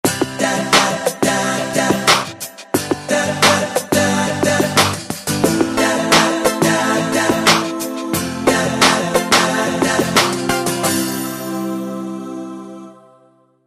cool tone

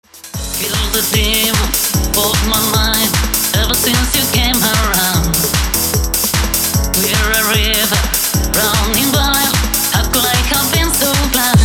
hard edm workout 150 bpm

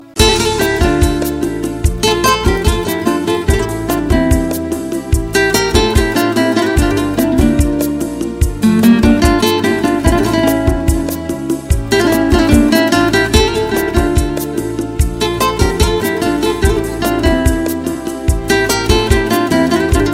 awesome spanish guitar

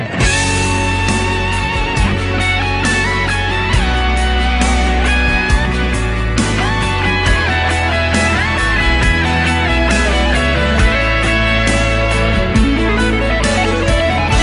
guitar solo

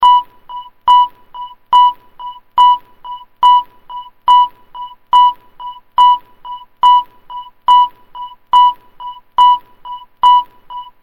sonar tone

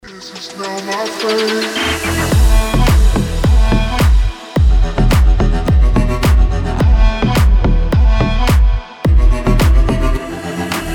slow bass

